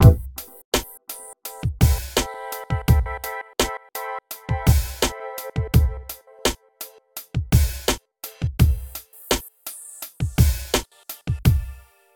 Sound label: Signature Sound; FXHome; Signature Tracks